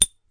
found sound schrauben; UNUSUAL KITS and FX; Werkstatt Found Sound Kit

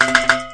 found sound ovenrohr; UNUSUAL KITS and FX; Werkstatt Found Sound Kit

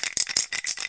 found sound dosen; UNUSUAL KITS and FX; Werkstatt Found Sound Kit

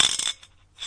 found sound dosen; UNUSUAL KITS and FX; Werkstatt Found Sound Kit

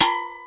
found sound kettenschüssel; UNUSUAL KITS and FX; Werkstatt Found Sound Kit

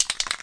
found sound dosen; UNUSUAL KITS and FX; Werkstatt Found Sound Kit

